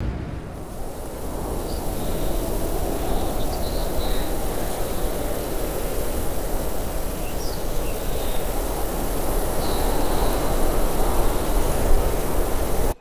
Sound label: rapid bird wingbeats over gusty wind; wings